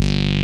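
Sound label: TALK SYN BASS; Pro-Rec - Synthsonic; TRINITY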